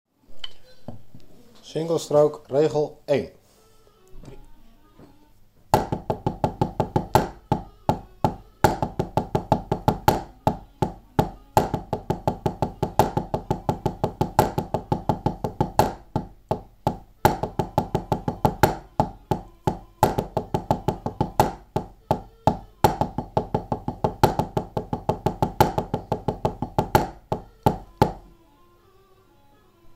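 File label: Single stroke roll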